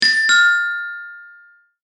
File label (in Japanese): 正解